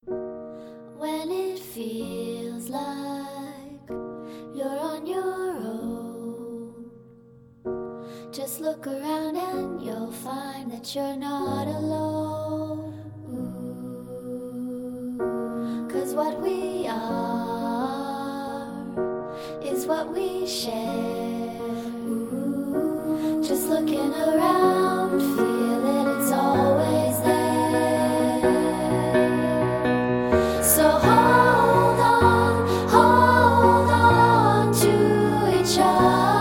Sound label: Kids Choir; Ogilvy